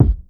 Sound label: warm steel kick drum g sharp key; Duration 0:01; kick drum samples